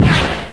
launch

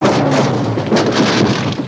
crash

